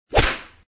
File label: hit; marvel; city under attack